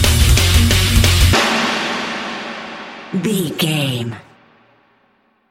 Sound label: rock power stinger